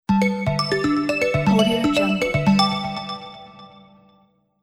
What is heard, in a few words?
delighted bells logo